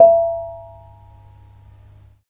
BONANG2 E5; Spectrasonics; Heart of Asia

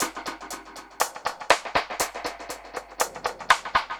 Dub Drums B Echo Kit 120bpm; musicradar

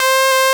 BRASS C3; Analogon

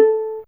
Viol Pizz A4, PizzStr; Fairlight CMI IIe

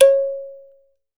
THUMB PI, Kalimbas; E-MU Producer Series Vol. 7 – Old World Instruments

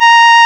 REED A#; Big Fish Audio; Synclav FM

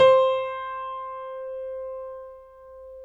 PNO XYPNO, Grand Pno, Roland; Club-50 - Foundations; Grand Pno 3

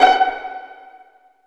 STR PIZZ, String Sections, Violins Pizz; Roland LCDP13

